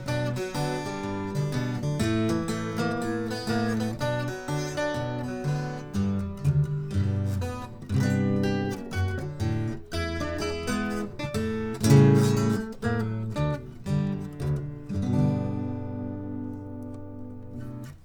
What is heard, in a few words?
4th fret compare; Gibson B45-12; tuned down a fourth, heavy Elixir strings